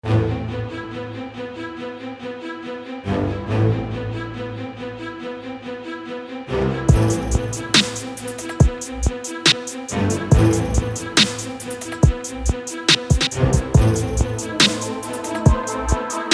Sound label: Etherial dubstep